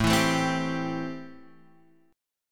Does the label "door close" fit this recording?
No